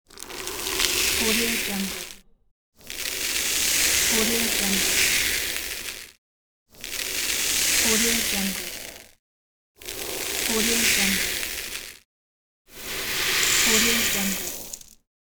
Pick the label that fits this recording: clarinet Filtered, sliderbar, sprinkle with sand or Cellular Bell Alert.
sprinkle with sand